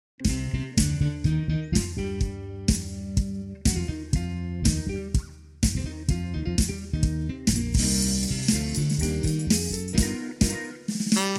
RETRO GROOVE TENOR, Tenor Sax; Piano accompaniment

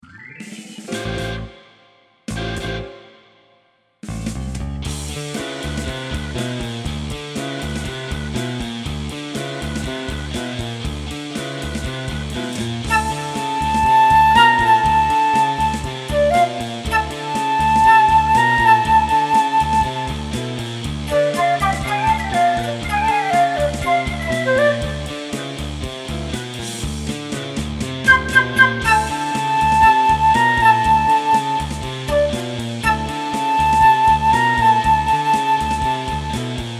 flute

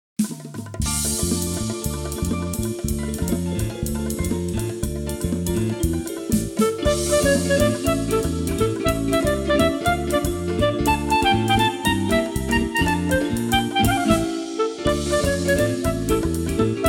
clarinet

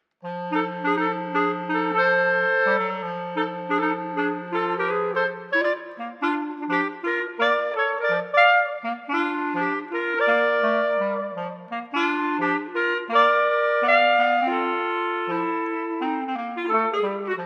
Clarinet Trio

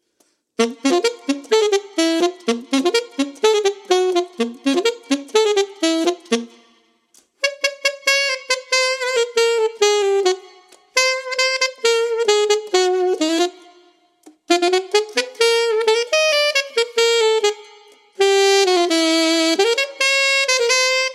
Tango Alto Sax; 52 Melodic Etudes for Saxophone Book 2

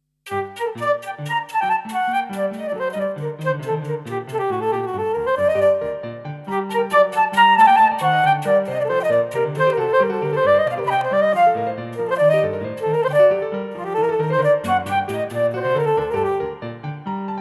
flute

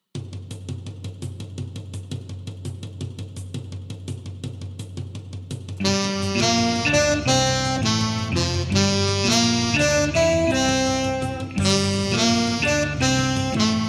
Tenor, sax; 1950s

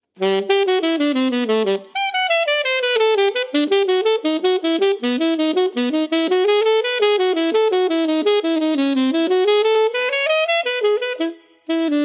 Shifting Major Scales Alto Sax

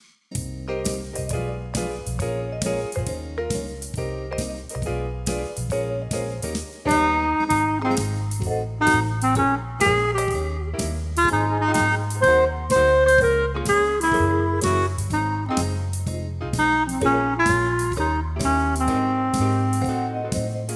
CLARINET

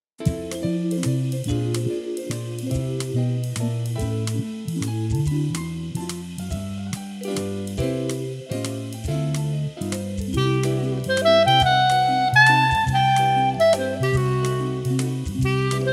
JAZZY WALTZ CLARINET

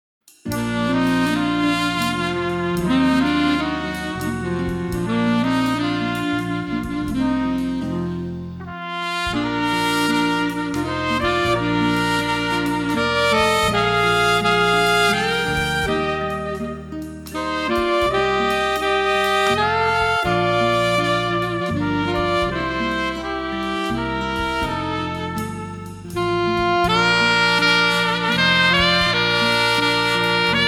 Trumpet and Alto sax, Duets; Performance

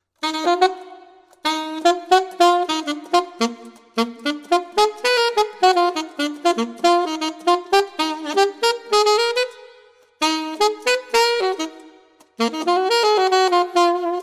Alto Sax; 52 MELODIC ETUDES-ALTO SAX Bk 2

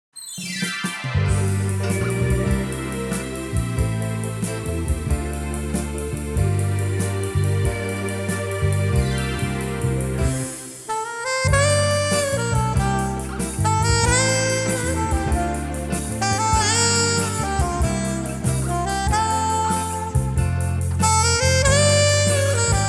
alto

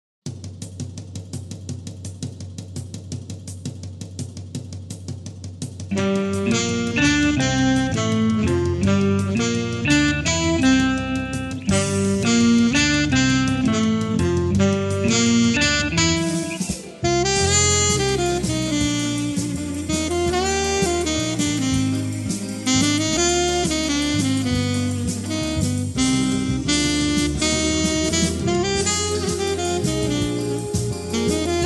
Alto, Alto Sax; 1950s

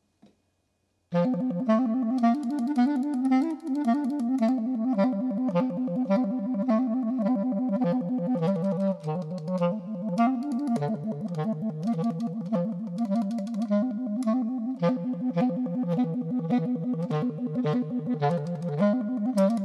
solo jazz clarinet; 3 pieces for solo jazz clarinet

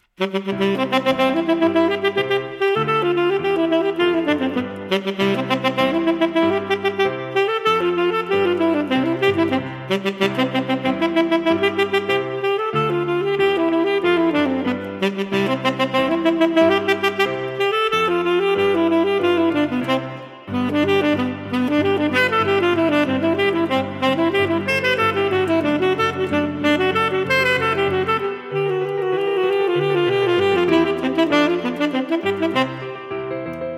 allegro on an arpeggio tenor sax

